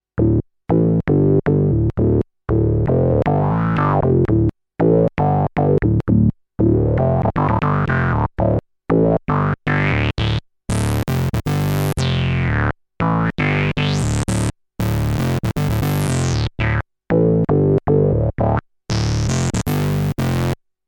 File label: Shaper Sinus FM; Mayer MD900